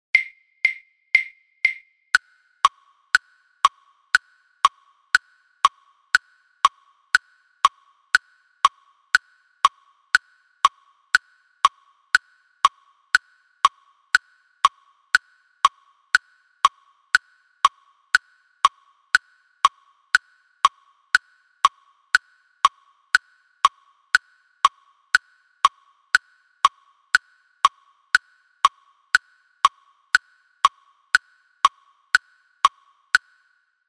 click 120 bpm; etude no 3

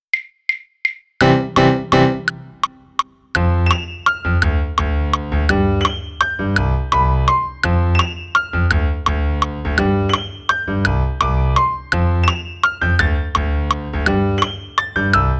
viennese waltz piano click

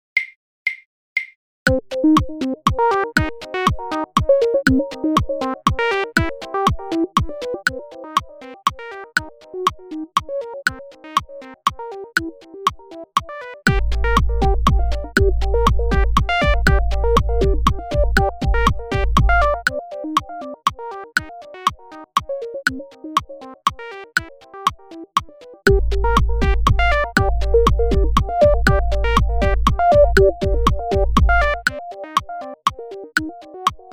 synth click 120 bpm

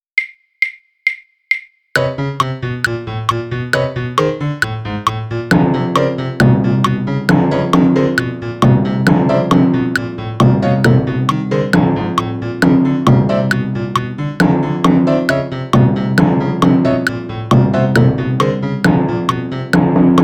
piano timpani click

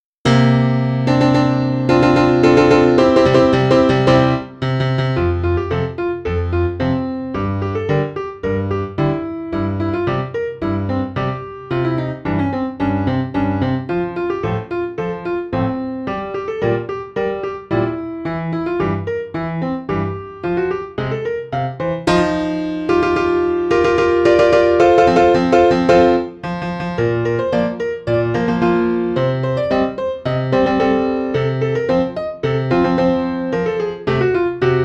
slavny navrat glorious return piano

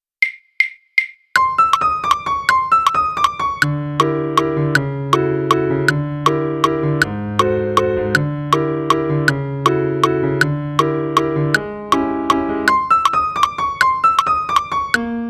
piano click; kolotoc carousel

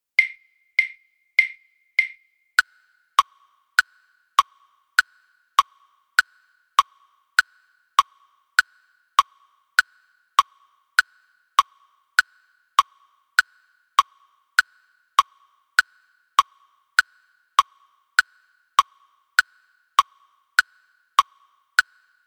click 100 bpm; etude no 8

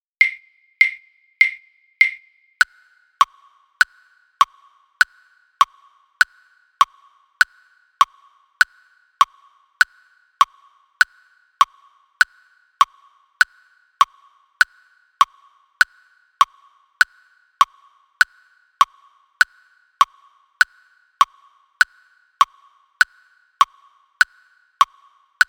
caterpillar click; housenka

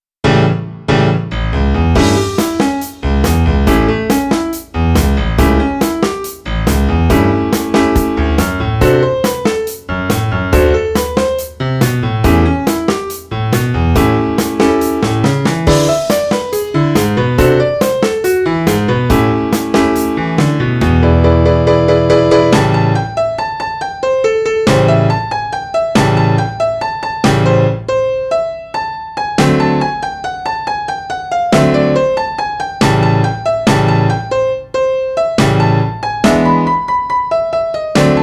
rock n roll piano drum kit

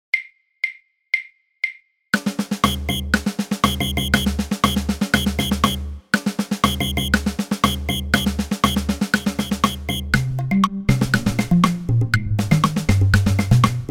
snare drum synth click 120 bpm

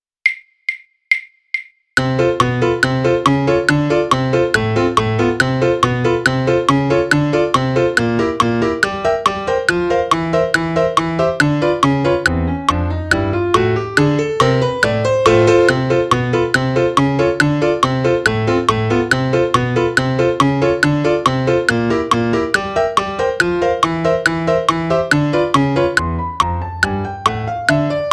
piano click; datel woodpecker

